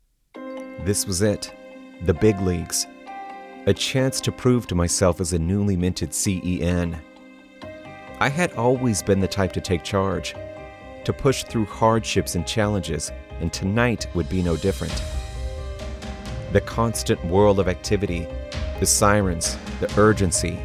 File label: Retail Promo Multitrack